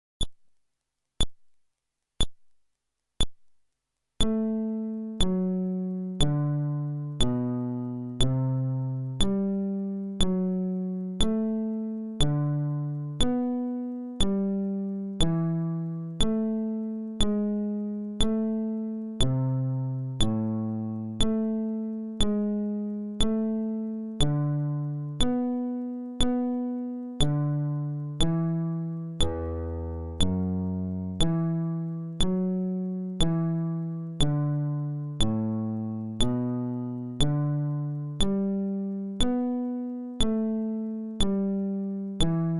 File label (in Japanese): フレットレス